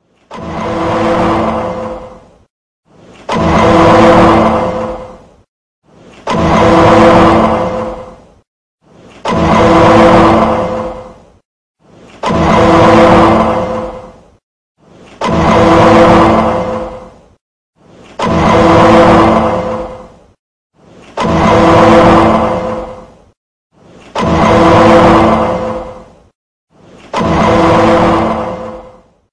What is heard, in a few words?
doink; law and order